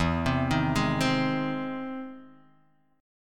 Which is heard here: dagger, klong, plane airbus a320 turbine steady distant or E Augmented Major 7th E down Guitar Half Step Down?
E Augmented Major 7th E down Guitar Half Step Down